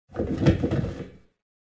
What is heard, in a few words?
plastic; ambience; Soldier Of Fortune II Double Helix; XBOX